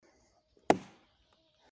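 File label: Kinuli topor v derevo, threw an axe into a tree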